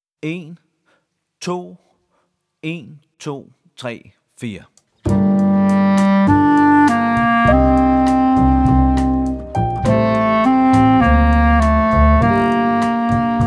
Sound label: Bossa